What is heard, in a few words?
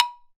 Tuned Percussion; Polyend; Simulacrum Sounds; 1 Shots; Acoustic and Electric